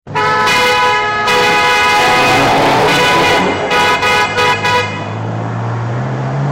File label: Intense car chase; カーチェイス; ElevenLabs